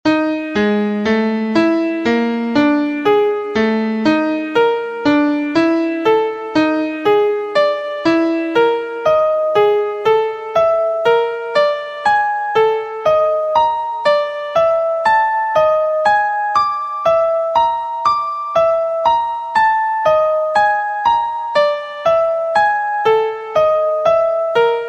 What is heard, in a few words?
Degree 1 b2 2 b5 5 b6 Atomic Scale Key Ab; harmonic and melodic equivalence; trichord pair